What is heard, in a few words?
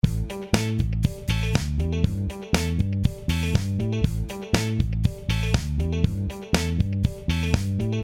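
DBX 160SL pumping extreme; Pumpen extrem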